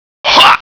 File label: hwah; Another one of Kim's win poses.; Kaphwan